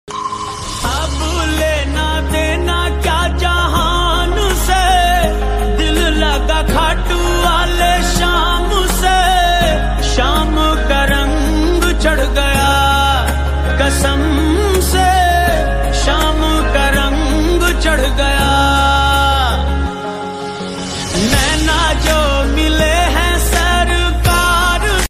song; khatu shyam ji

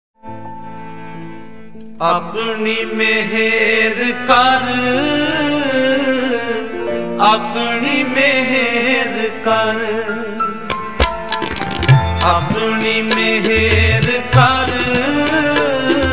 gurbani shabad ringtone

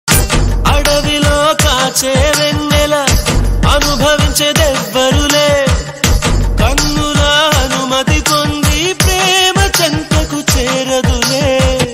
telugu love song sad; 7g brindavan colony

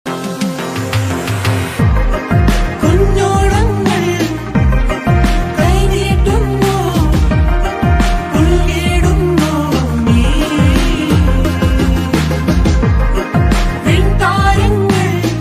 title track ringtone, malayalam ringtone; ranam

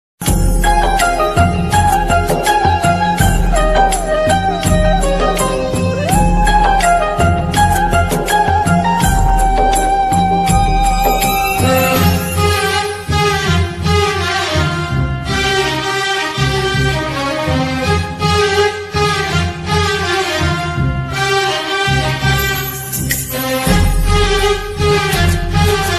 song lovly bgm ringtone

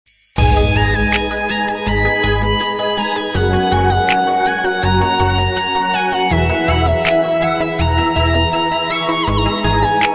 odia sad ringtone

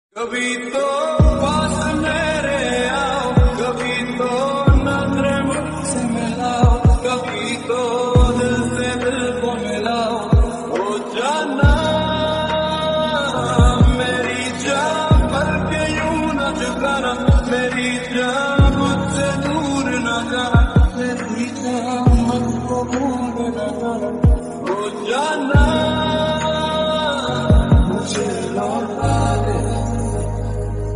romantic ringtone hindi ringtone love ringtone

